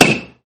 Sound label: mallet hit